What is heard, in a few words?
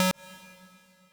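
square lead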